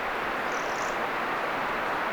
kulorastaslintu

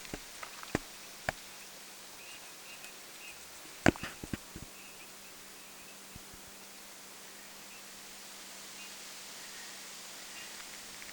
tuollaista punatulkkujen aantelya

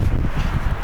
kurpan aani

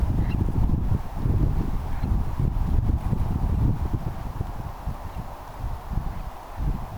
suippopyrstokurppa

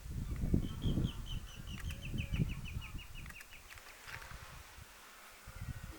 tuollainen valkoviklon aantely

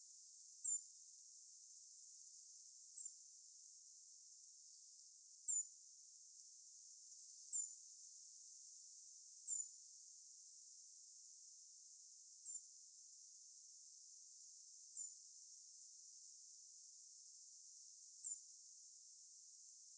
ti-puukiipija

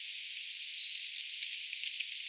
rubiinisatakielen tyyppinen ääni mikä laji

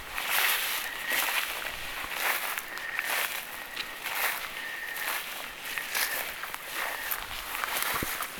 siina on sita lintua etsiessa siita pienehkosta pusikosta kaksi kahden tak-aanen sarjaa

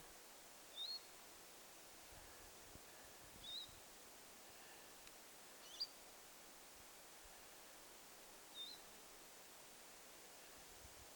pajulintulintu aantelee

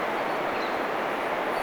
mika rastaslaji

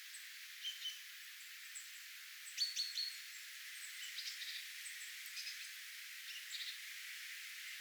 erikoisesti aanteleva tiainen ilmeisesti

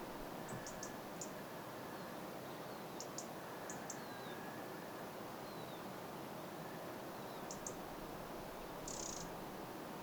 lintutornin vierella peukaloisia

